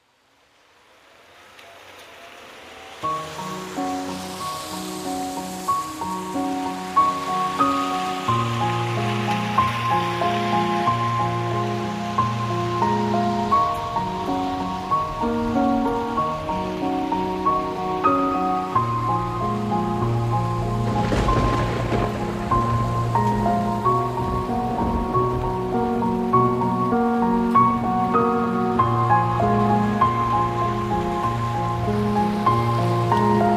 Piano and Rain

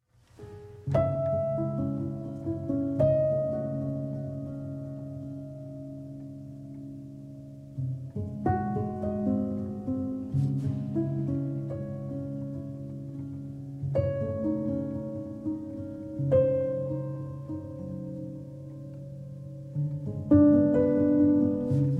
Waltz in C-Sharp Minor, for Piano; Op. 64, No. 2

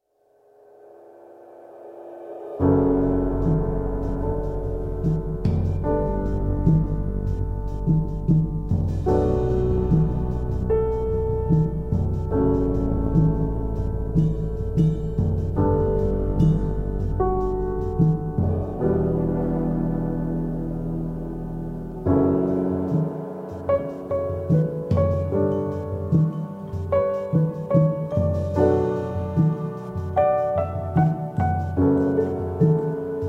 Piano Reworks

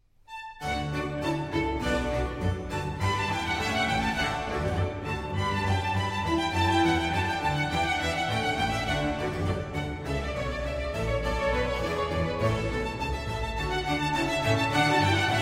Trumpet Concerto in D Major, Allegro; Torelli

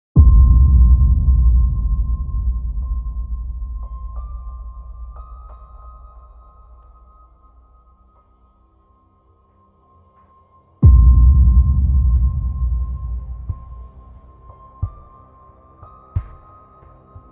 First Movement From Piano Sonata No. 8 in C Minor; Pathétique; Op. 13

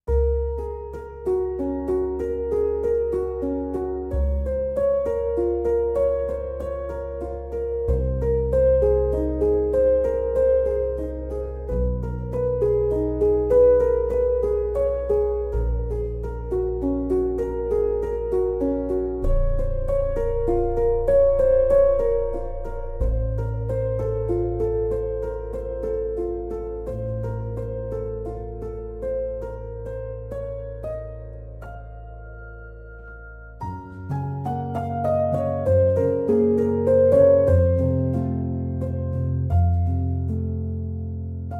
Solo Piano Version

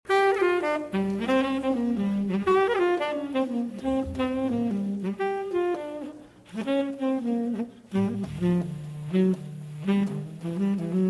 Quartet, Blues

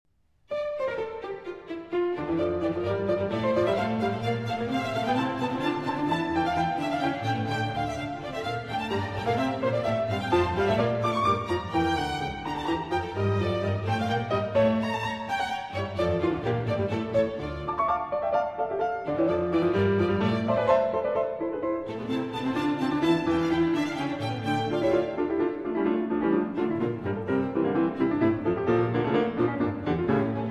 Keyboard Concerto in D Minor, Allegro; BWV 1052 III.

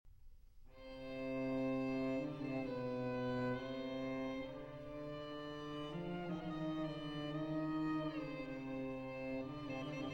String Quartet

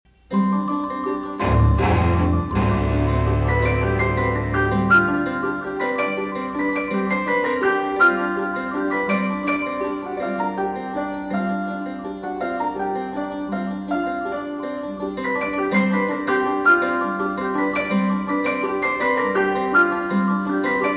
Gamelan Allegro

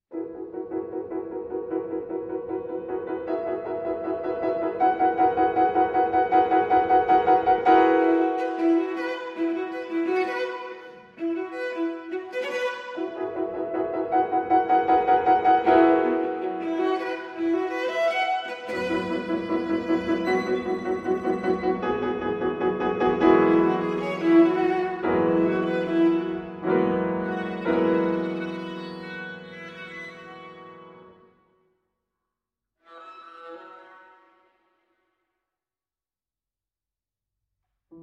Viola Sonata, Allegretto scherzando

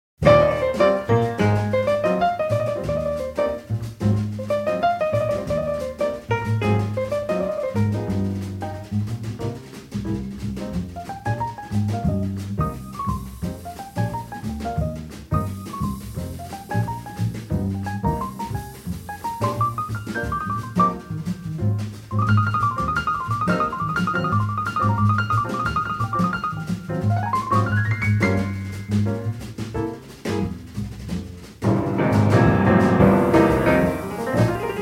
Rhumba

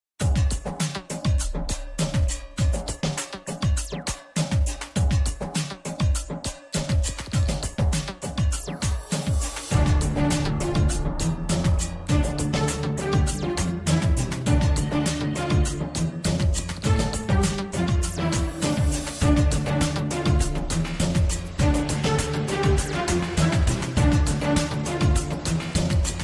Steampunk Music Instrumental

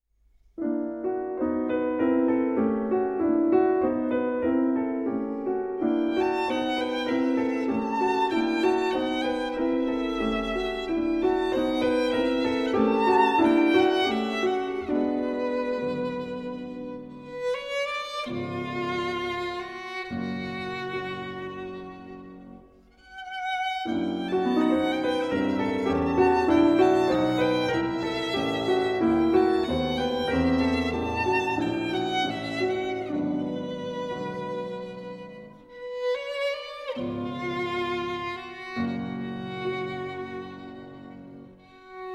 Pieces For Violin and Piano, Quasi Ballata

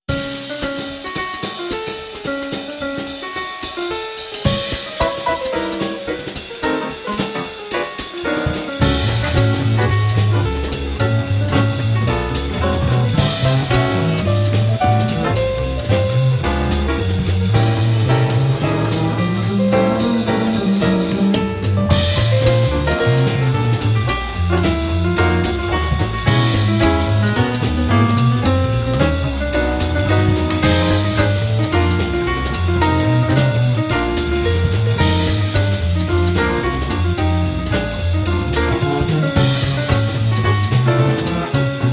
In F-Sharp Minor, Jazz Ensemble

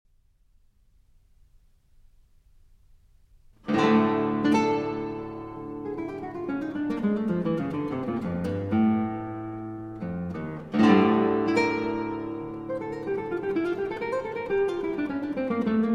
Sonata For Guitar Solo - 1. Allegro; Op. 61; Turina; Allegretto Tranquillo

